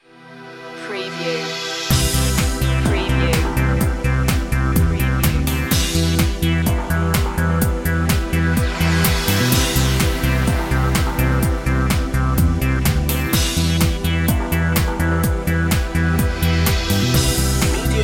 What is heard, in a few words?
monks pulse